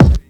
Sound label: KICK, Hip Hop